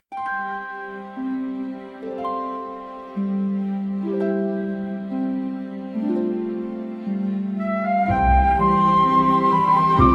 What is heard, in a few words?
Victory